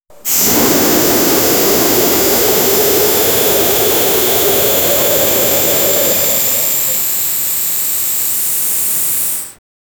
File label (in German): dampfsto, steam